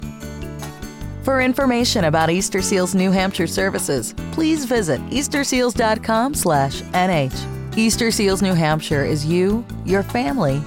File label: On Hold